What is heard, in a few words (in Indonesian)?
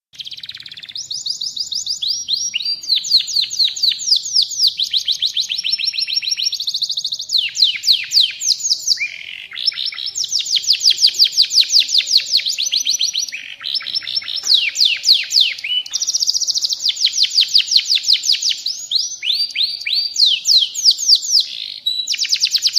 kenari f1; Suara Kenari F1 Belajar Nembak; Burung Kicau